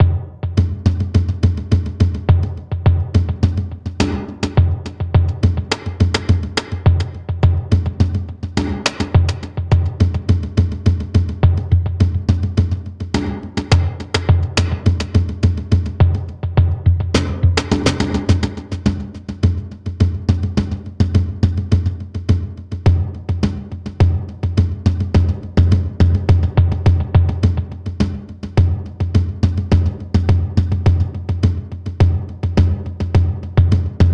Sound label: Wind Drums; gamerip; The Undergarden; xbla xbox 360